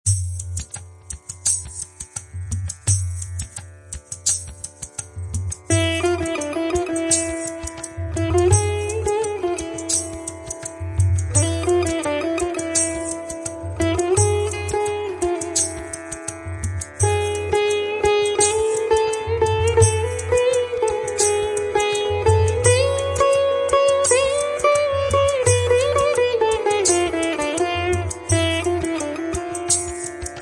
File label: Sitar and tanpura indian style bgm bollywood